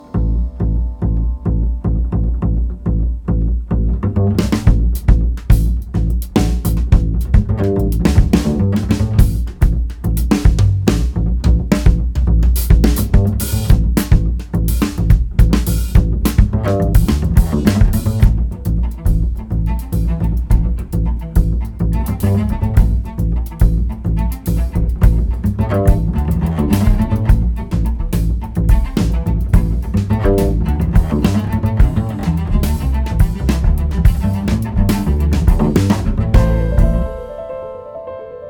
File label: Locked Cut; Tuesday Microgrooves; Raw Book of Music Part 1 and 2